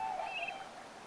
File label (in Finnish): tuollainen tyllilinnun aani